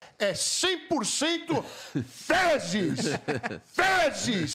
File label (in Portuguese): vinheteiro